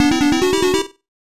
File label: Adding to Timer